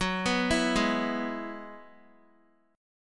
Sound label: Gb 7th Suspended 2nd Suspended 4th, Guitar; Gb7sus2sus4 arpeggiated